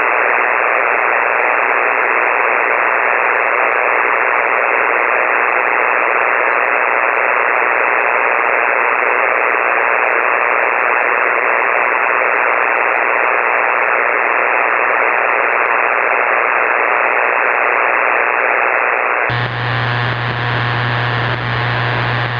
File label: pwrline multsources ssb am; Same as above showing difference between SSB (first half) and AM mode (second half).; 6 Meter; N1BUG